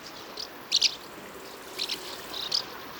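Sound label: house martin urbicum flight flight call; witt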